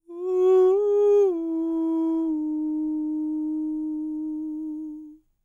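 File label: falsetto, Vocal Planet Jazz FX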